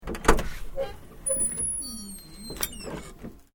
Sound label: Door Metal Open; Various doors